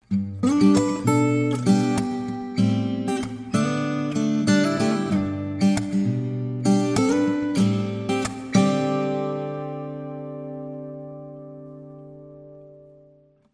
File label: samsung galaxy s20 plus